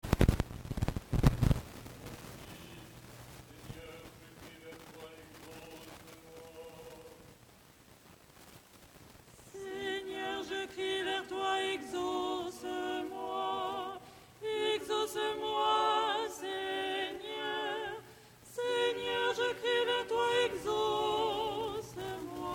Ton6 stichere lucernaire soprano

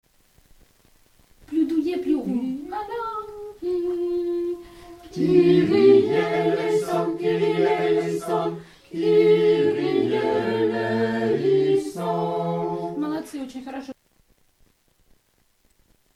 Kyrie triple ensemble

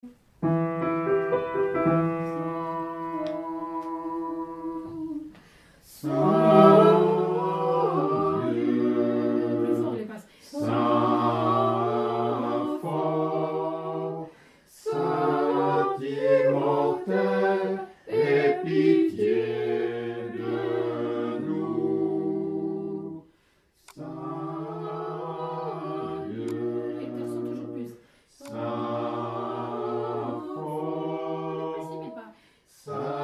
trisagion ensemble; Trisagion nouveau

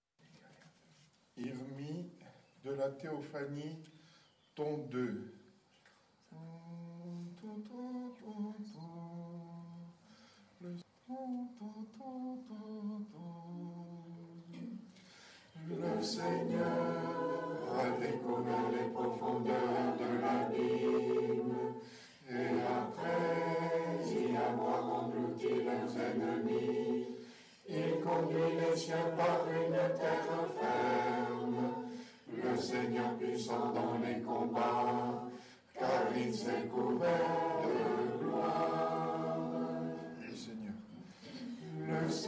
Ton Hirmos canon Theophanie

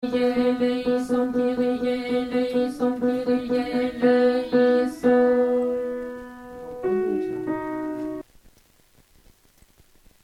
Kyrie defunt basse

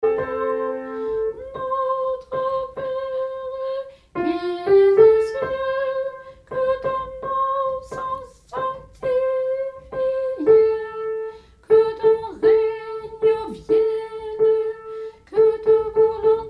Notre Pere Tenor

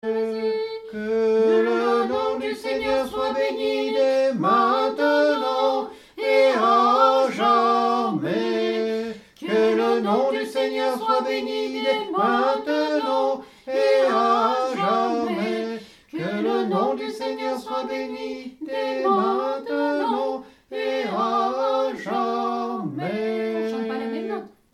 Que le Nom du Seigneur Tenor

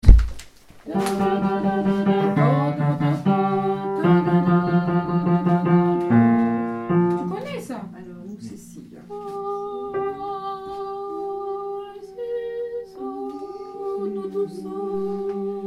Protectrice des chretiens ensemble

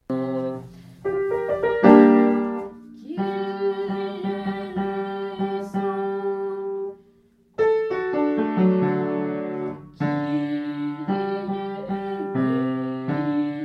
Kyrie Basse

